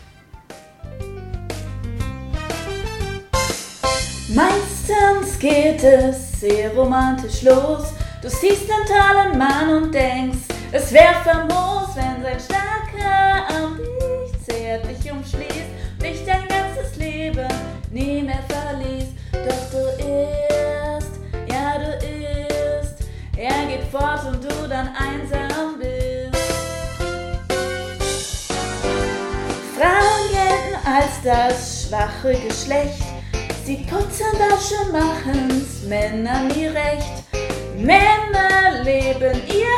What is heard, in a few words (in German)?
Gesang Männer